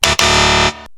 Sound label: wrong sound; bing end of week quiz